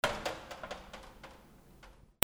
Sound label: DOORS